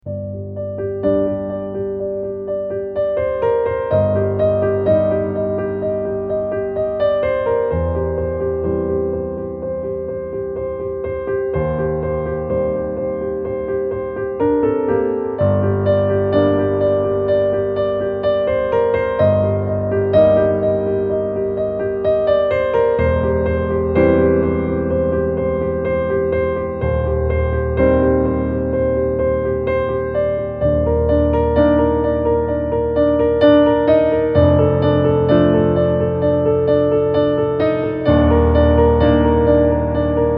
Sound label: emotional solo piano